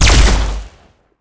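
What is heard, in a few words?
Weapon alien blaster fire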